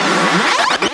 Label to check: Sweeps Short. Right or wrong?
Right